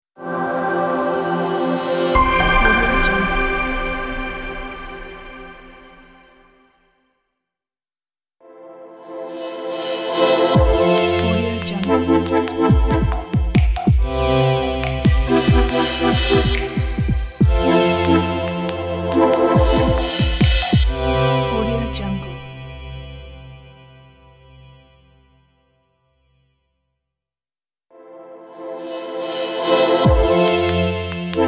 inspirational atmospheric logo pack

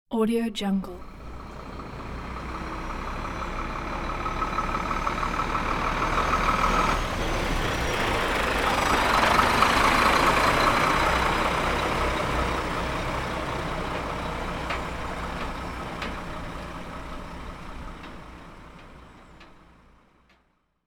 concrete mixer truck slow passby

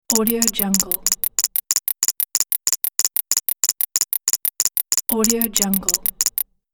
scifi loading bar

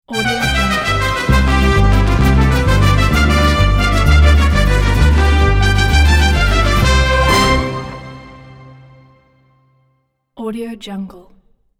mexican intro logo; bequadro

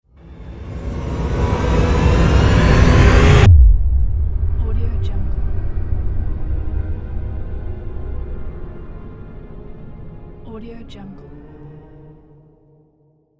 swoosh cinematic epic trailer hit

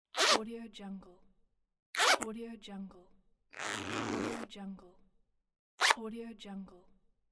zipping

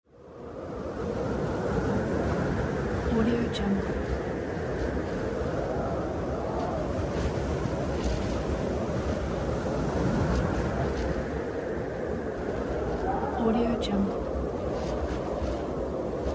wind

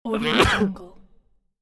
sneeze sound

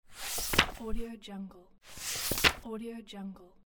page turn flip

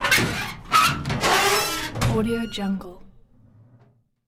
creaking hinges; ndeemax

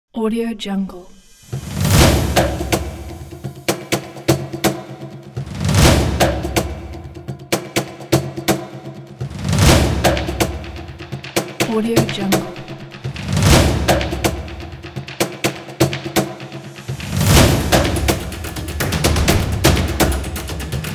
drums

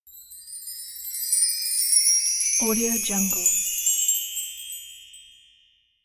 wind chimes

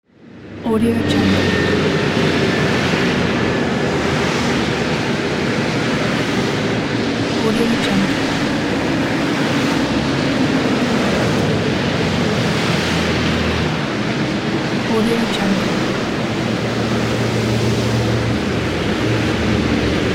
highway traffic heavy

